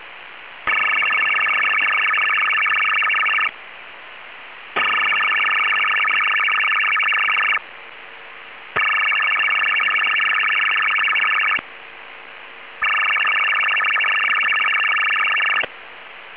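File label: Chinese MIL 4FSK 500, CHINESE MIL 4-FSK 100Bd 500Hz; CHN-MIL-4FSK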